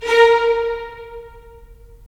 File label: STR VLS, Violins; Violins Sh; Roland - LA Composer vol. 4